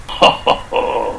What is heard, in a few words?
hohohohohohohhoohohohohohohohohohohohohohohohoho